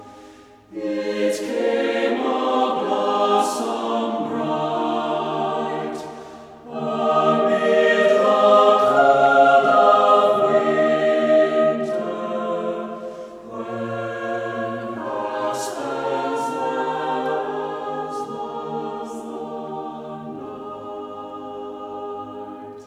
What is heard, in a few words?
Lo How a Rose E er Blooming for Choir